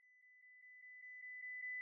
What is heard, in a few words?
amp steps